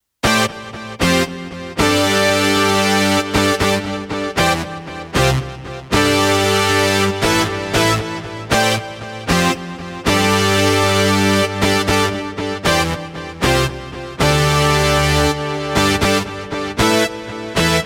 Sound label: brass; vr-09 jmp